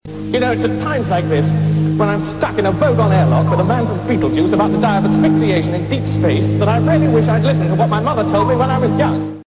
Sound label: airlock